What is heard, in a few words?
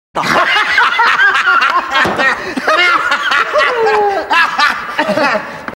hahahahahahah